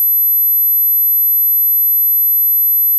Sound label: 12kHz -9dBFS